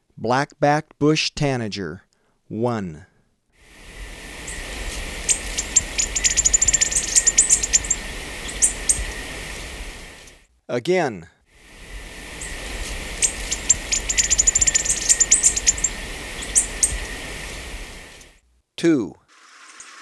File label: Black-Backed Bush-Tanager, Birds of Ecuador; S. America